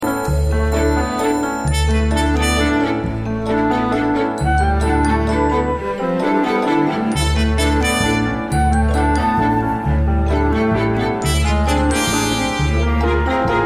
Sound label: Accompaniment Only